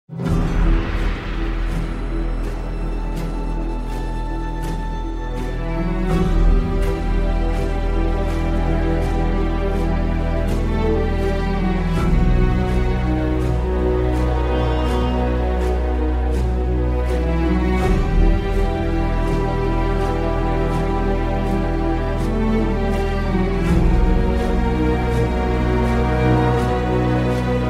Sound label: Theme Music; Yellowstone